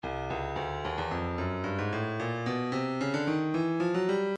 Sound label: stylus breakbeat midi files 111 bpm; Buckwheat C